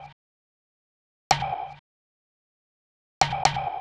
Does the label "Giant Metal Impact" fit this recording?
No